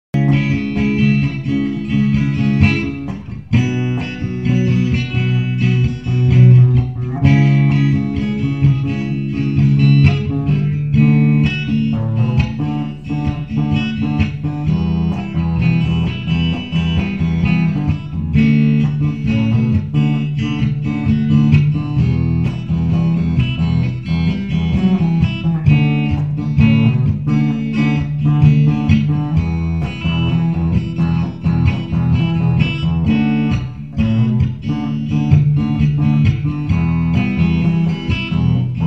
live instrumental

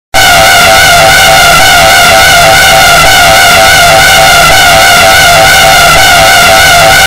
jumpscare alarm sound scary sounds creepy

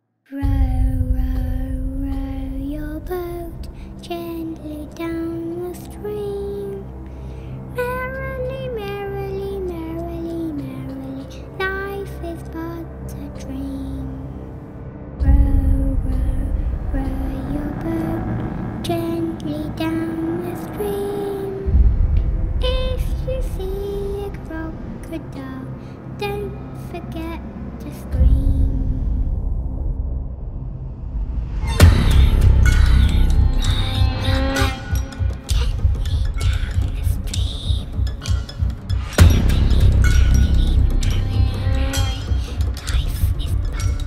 epic hybrid horror lullaby

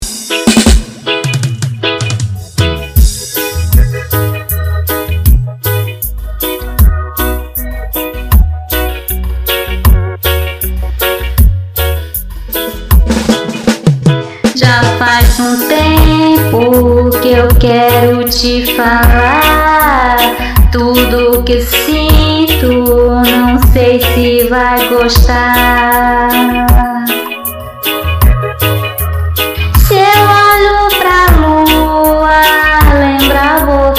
reggae remix

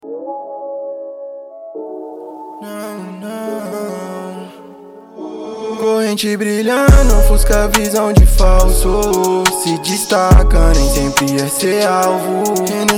rap trap

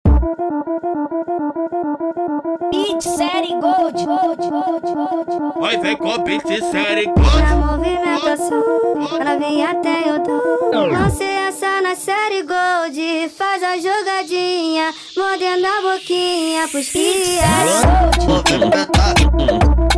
Beat

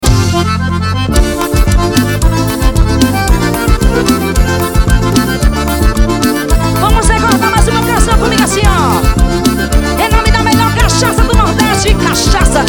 forro

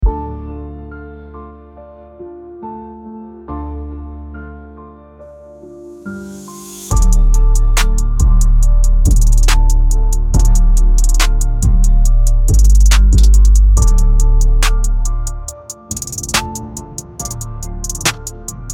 Hard Dark Trap Type Beat; Invincible

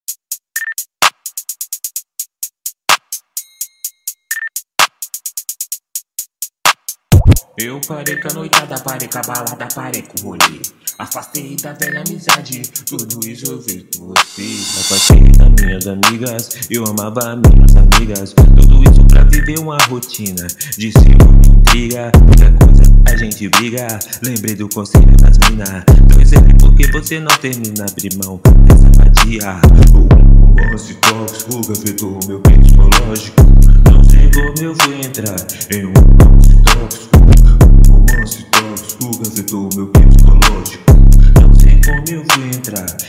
hip hop

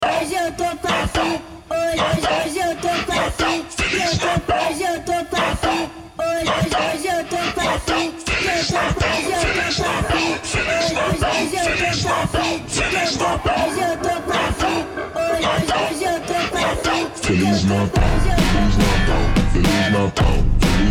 slowed

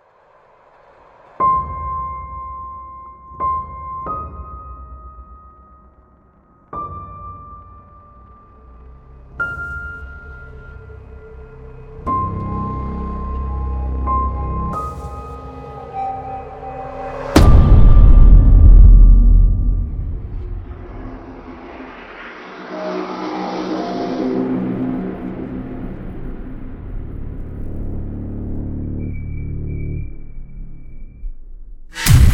epic horror sound design; trailer

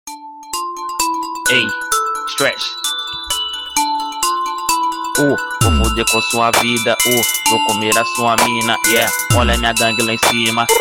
trap

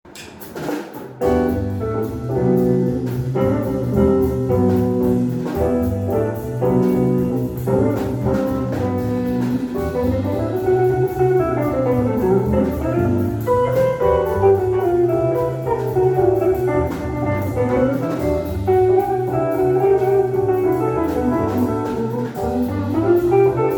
Jazz

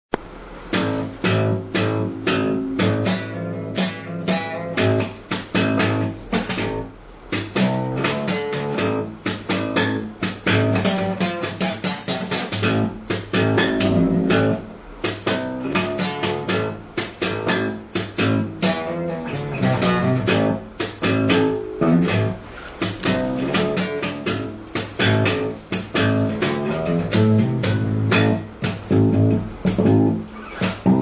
slap strong lines, bass

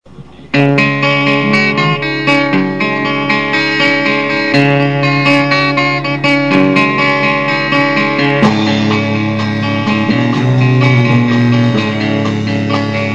instrumental

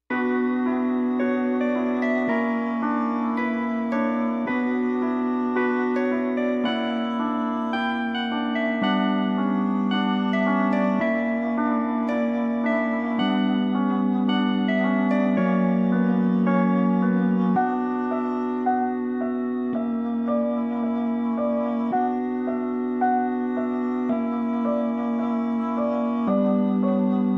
Bb Harmonic Minor 110bpm

